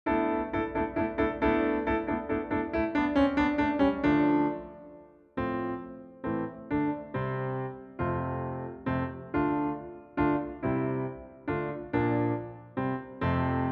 accompaniment accompaniment only; peter warlock; basse danse; from capriol suite

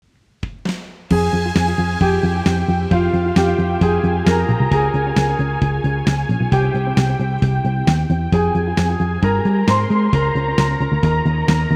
sax

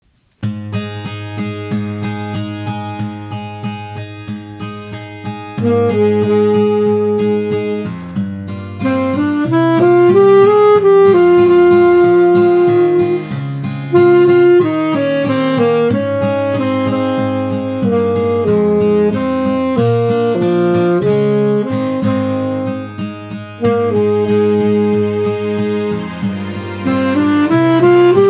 sax

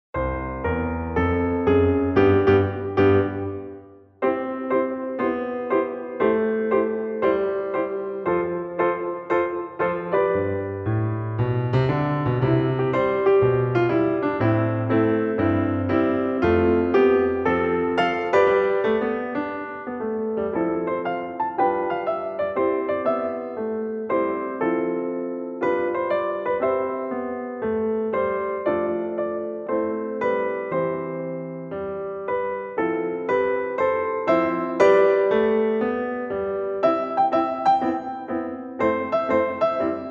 accompaniment

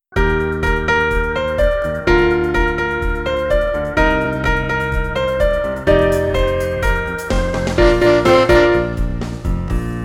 sax

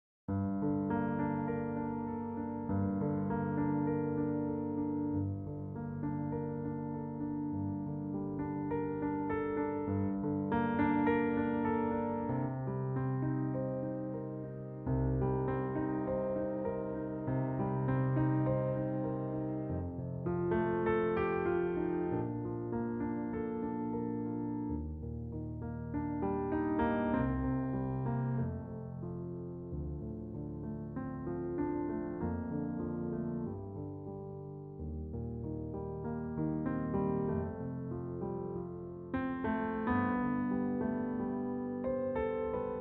accompaniment only

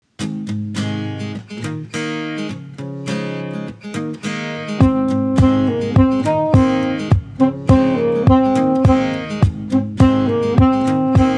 sax

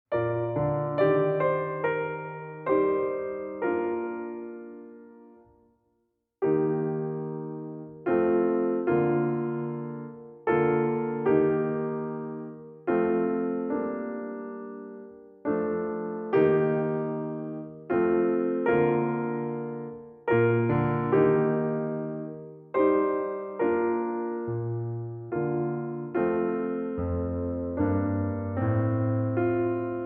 Eb accompaniment; gustav holst; jupiter; from the planets op. 32

